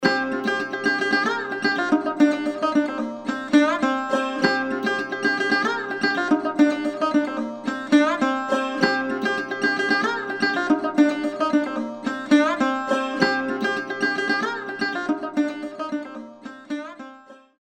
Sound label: Exotic Sarod; Apple GarageBand 2; Apple Loops